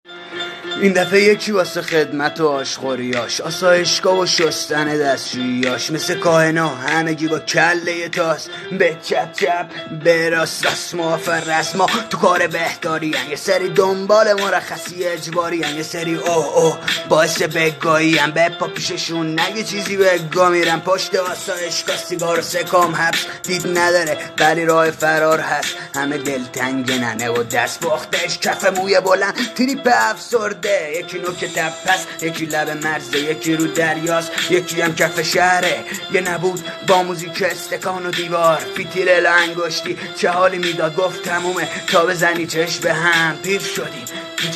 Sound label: Music Rap